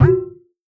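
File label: water, percussion; Masterbits - Total Zone; ADD.PERC.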